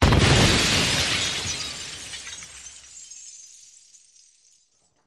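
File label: Explosion Glass Debris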